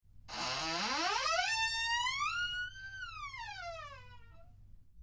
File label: Creaking Door; horror sfx vol 1